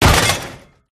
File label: gunstand drop; gow; turret judgement